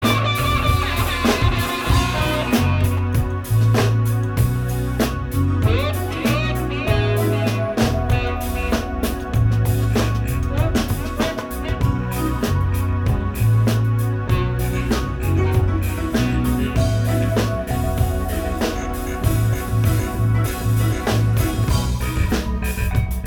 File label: Improv Jam